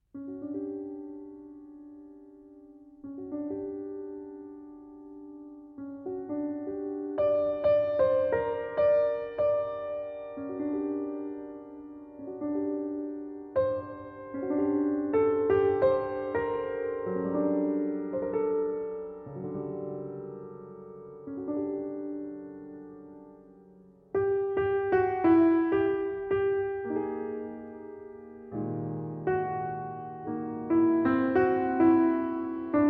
improvisations on hungarian peasant songs lento rubato; op. 20; sz. 74 iii